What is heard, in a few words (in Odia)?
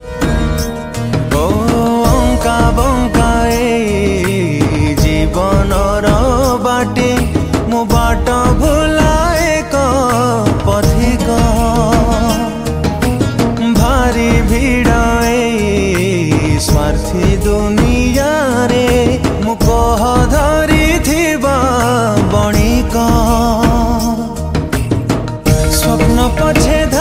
Odia New Sad Song